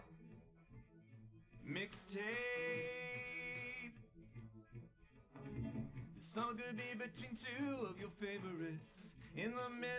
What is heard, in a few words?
drums live rec 250ms 5cm; SiSEC11; underdetermined